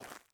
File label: Footstep Dirt; Among Us